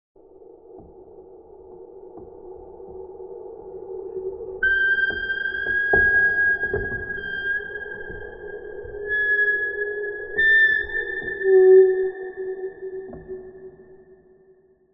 Cinematic Metallic Riser, Trailer, Designed Eerie Wail; Jake Fielding; Haunted Metal Vol.2; Cinematic Creaks & Risers